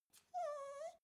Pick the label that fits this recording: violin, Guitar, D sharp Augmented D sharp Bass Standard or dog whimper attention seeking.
dog whimper attention seeking